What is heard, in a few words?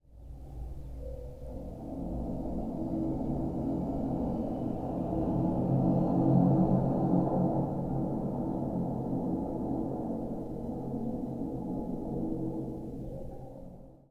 hydrophone as contact mic; hydrophone 40ft